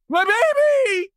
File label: town human far; Blind Drive